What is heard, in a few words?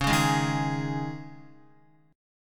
C sharp Suspended 2nd, C sharp, Guitar; C#sus2; strummed; JGuitar